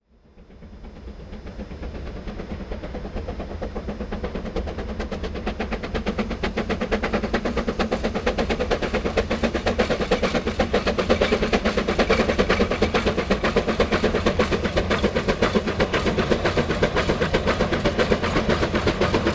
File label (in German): dampflok sound china